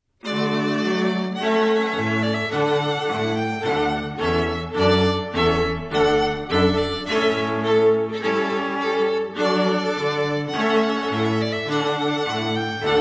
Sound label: Quartet Trumpet Voluntary